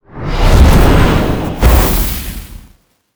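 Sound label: arm demon attack, monster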